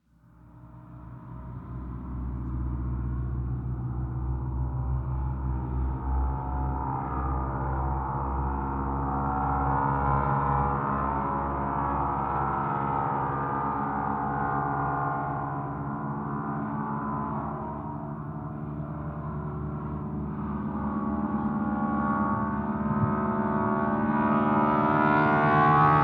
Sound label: airfield hangar passby; War Thunder